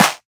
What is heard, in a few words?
raw clap sound g sharp key; single hits one shots